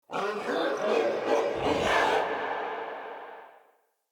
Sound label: Battle Dog Distant Approach Bark; Animals; Vocals; Snc Battle Dog